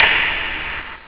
hihat, drums; OctaMED SoundStudio V1

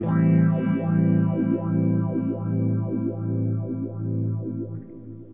STRUM, Guitar; LiveAct Pool

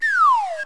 down drain

